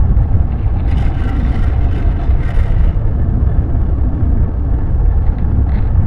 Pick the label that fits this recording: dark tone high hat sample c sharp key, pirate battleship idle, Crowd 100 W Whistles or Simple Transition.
pirate battleship idle